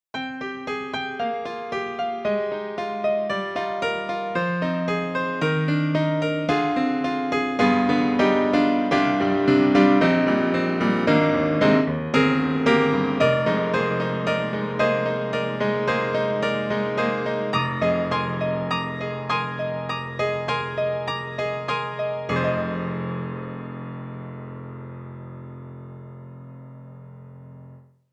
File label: Tiny but Bright; Cakewalk; Cakewalk Sound Center